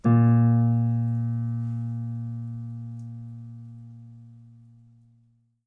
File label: piano sample